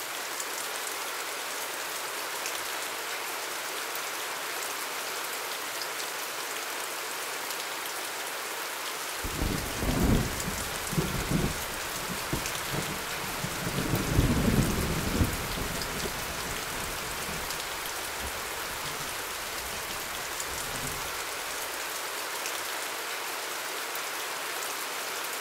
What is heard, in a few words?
Heavy Rain and Thunder